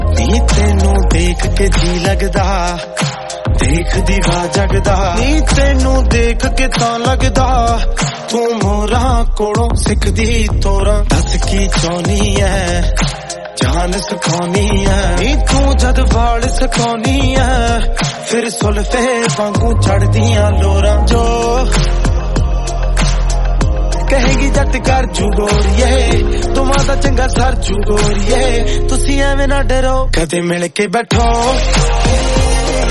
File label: Punjabi song ringtone